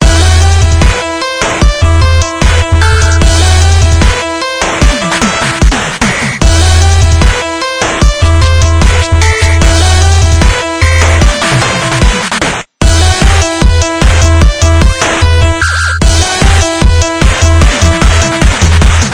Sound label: 8bit